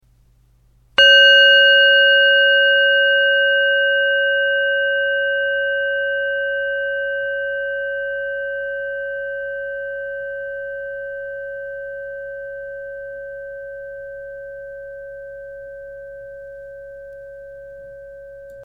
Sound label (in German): Klangschale